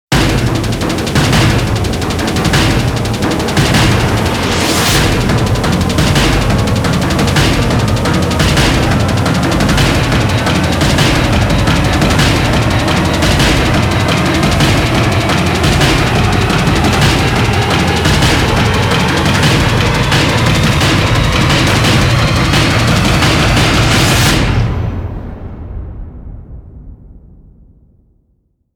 Swarming Angry Bees

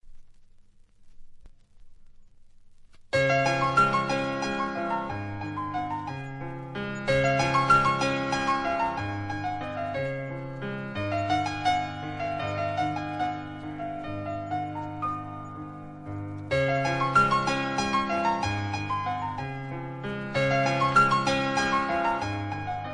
Rondo Pour Un Tout Petit Enfant (piano solo)

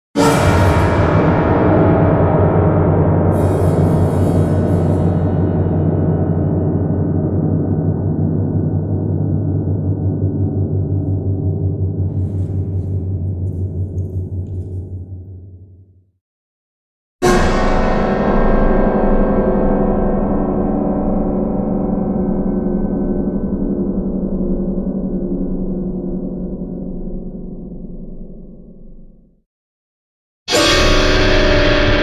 Scraping Strings; Epic Tools vol. 1

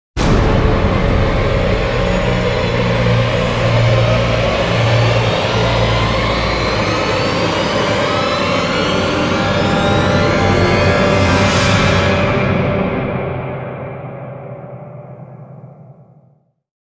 Orchestral Riser; Epic Tools vol. 1

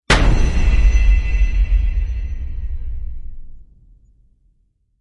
Hit Metal; Ninja Tracks

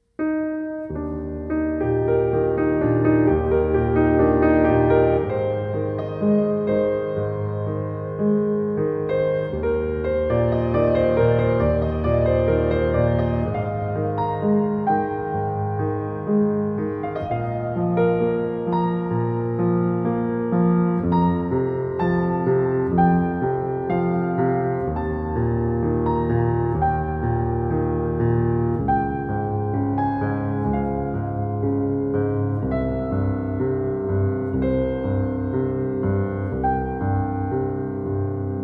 Piano and Cello

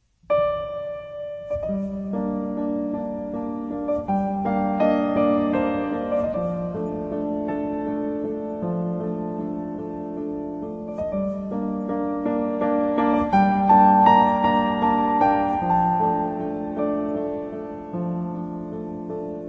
Gentle Rain, String Ensemble

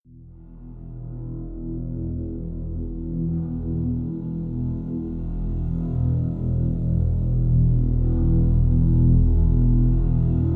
Drn Black Gold (E); Brand X Music; Brand X Music Catalogue - Editor's Essentials

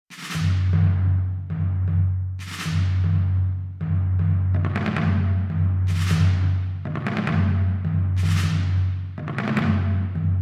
Drums Only Version

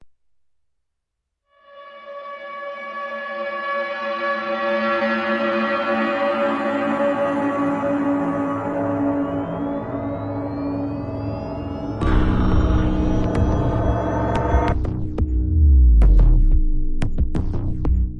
Torn Skies No Drums; Ninja Tracks

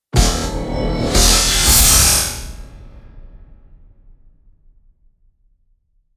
Storms Stinger; Gothic Storm Music; Advanced Sound Design 2012

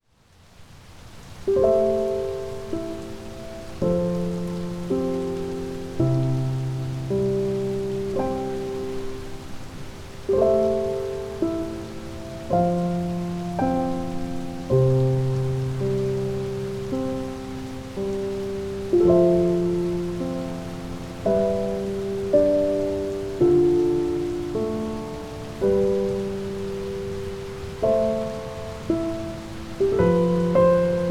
Piano (With Rain), Calming Piano Music with Rain

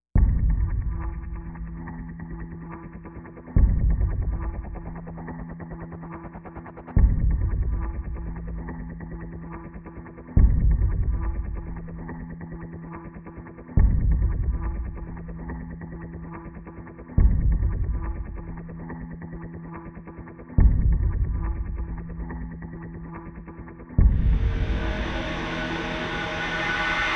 Pads and Pulses; Brand X Music

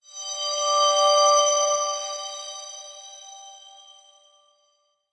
Glassblower; Brand X Music; Brand X Music Catalogue - Editor's Essentials

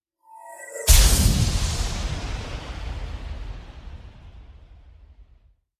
Impacts Abstract Explosion; Gothic Storm Music; Advanced Sound Design

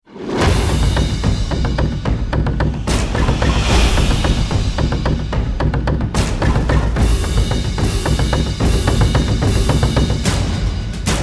Drums Only

